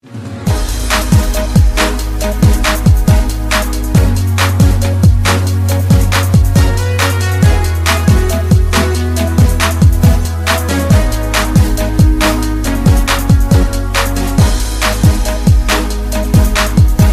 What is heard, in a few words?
Instrumental English Ringtone